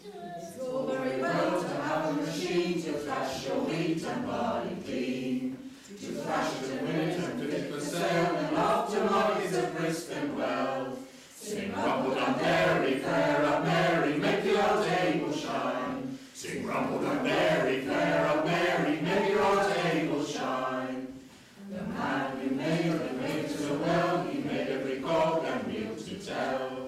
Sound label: Machiners Song